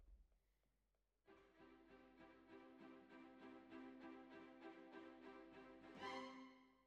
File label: Bassoon, Jazz for piano